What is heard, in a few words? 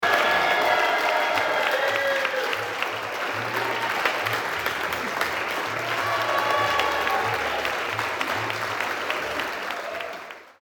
applaud